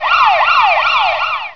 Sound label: siren